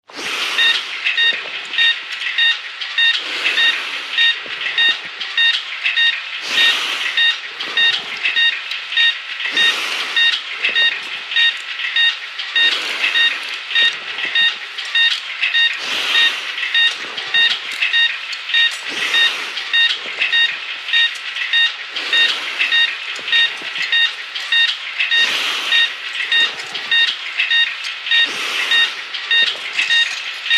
HOSPITAL EQUIPMENT HOSPITAL: Operating room, breathing bag & heart monitor, no voices.